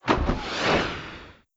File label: cannon